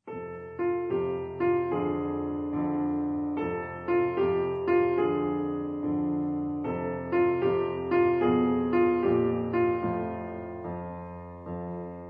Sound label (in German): Koala-Blues, Klavier; Tierisch klavierisch 1